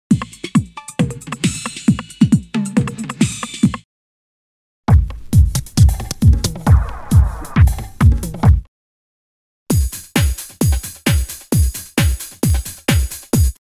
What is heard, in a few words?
TEKNO-HOUSE; Polestar Magnetics The X-Static Goldmine